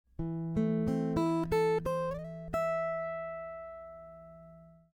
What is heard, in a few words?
guitar slides phrase; slides on guitar